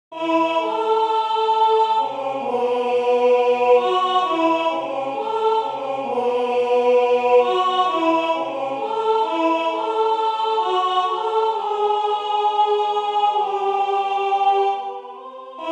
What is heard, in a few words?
choeur alto; WSS